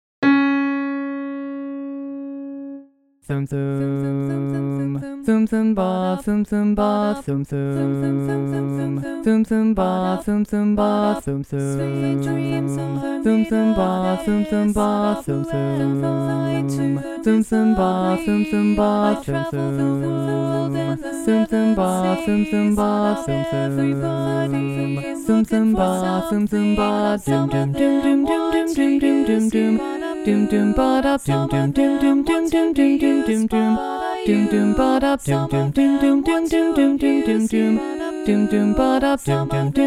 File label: bass dominant